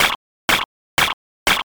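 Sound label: footsteps sand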